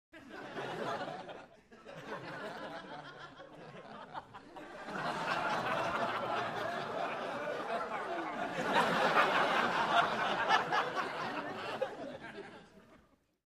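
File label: Crowd 100 Subdued Interior; medium int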